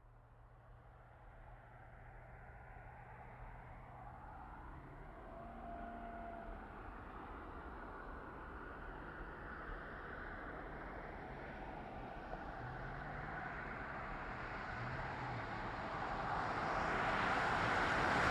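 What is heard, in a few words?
GMC Savana, Up, Fast, Edgy Moves, Off, Truck; 2010 GMC Savana; Vehicles; Big Room Sound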